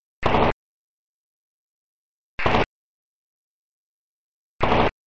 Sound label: NXDN idle control channel 461615kHz AF; scanning my utility company